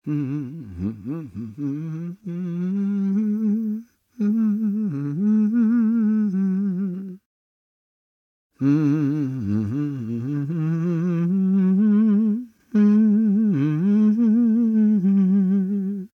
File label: Voice song, en; Norlock